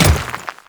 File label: Grineer Royal Guard Dirt Step, Footsteps